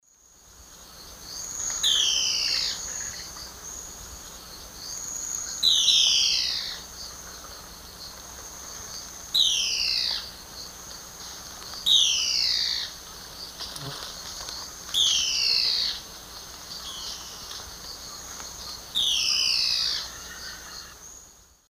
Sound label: Phlegopsis nigromaculata, call; S. America; Eastern Lowlands